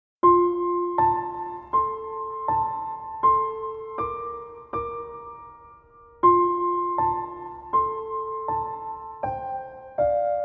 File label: Piano